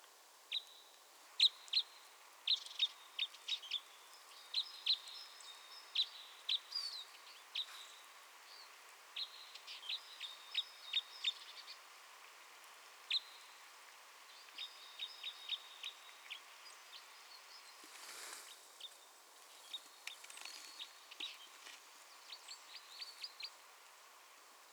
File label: Red Crossbill Loxia curvirostra